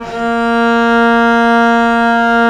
A#2, VIOLE, Historical Instruments; USB Soundscan vol.23

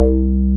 Synth Bass, Roland

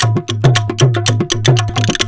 KARTH, Indian Traditions; USB Soundscan vol.45; AKAI

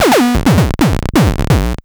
Drums Strange

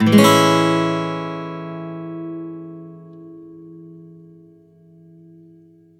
One Shot Amaj7 downstroke, Acoustic Guitar; Sonic Foundry (Sony Creative Software); Songwriter Acoustic Guitar Companion

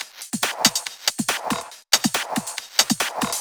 Loops 140 BPM Hihat Percussion Loops

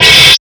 Hats, Alesis Performance Pad

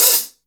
HH PD, Drum 1 Dry; East Collexion

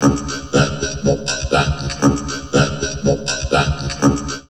VOCOD, Drum Loops Crazy Processed

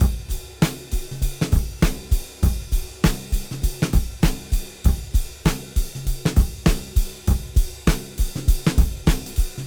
Cym Beat 99 BPM

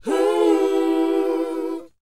WHOA D B; Spectrasonics; Vocal Planet; R&B Dance; WHOA OO

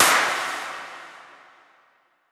Impacts, Effects

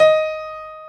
D#4 STR PIA, G.PIANO; AKAI S-Series CD-ROM Sound Library VOL-7

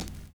VINYL HH, HH CLSD, Drums Dance & Groove; USB Soundscan vol.11; AKAI

